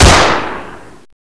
luger shoot

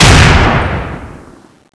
wa2000 shoot

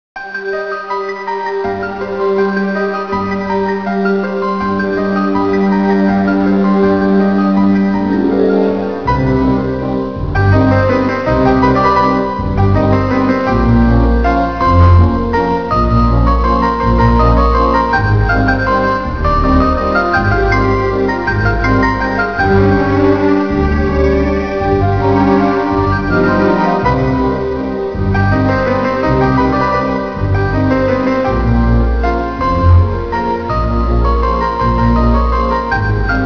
walz loop; castlevania

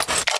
rocketlauncher reload; chicken fortress 2

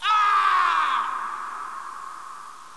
scream

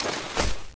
at4 draw

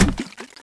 waterpistol clipin

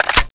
gun clip in; violingun

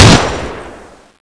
shoot; balrog7

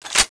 m82 clip in

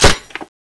mount gun empty

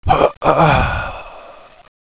pain, bot; cooked

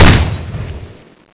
mk48 shoot

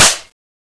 spas12 change

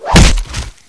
combat knife stab

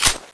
clip in; skull3

